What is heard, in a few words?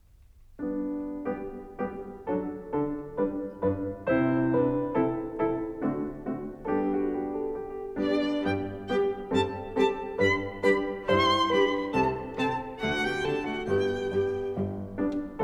in G Major, Allegretto, Cello; 10 Variations on Ich bin der Schneider Kakadu; Op. 121a Tema.